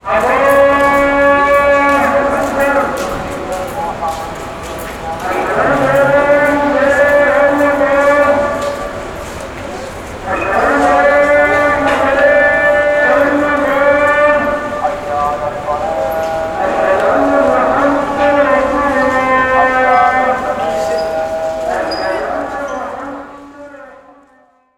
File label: AMBIENCE, Arabian Traditions; USB Soundscan vol.43; AKAI